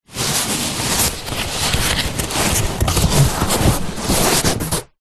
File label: sport exercise mat foam thin rolled up handling